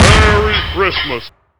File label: explode